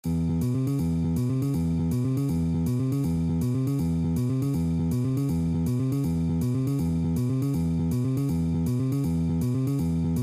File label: Boogie in E